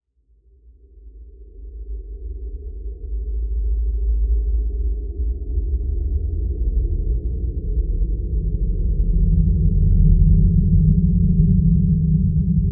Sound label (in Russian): horor odin pole s uzhasnim chuchelom